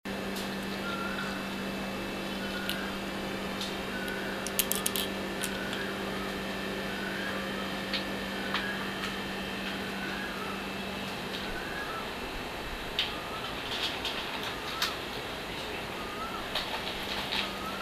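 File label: bangkok night ambience; south east asia street recordings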